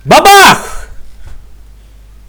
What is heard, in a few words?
explode; pvkii